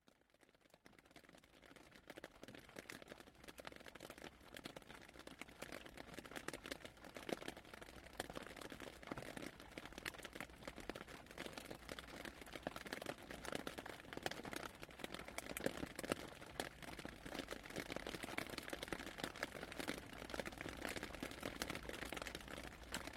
bird song and showers a mix of my dawn chorus and rain